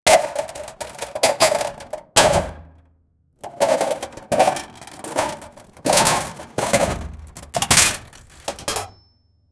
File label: a thing put in a, weapons